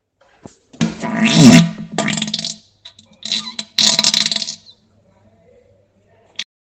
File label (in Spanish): sonido diarrea explosiva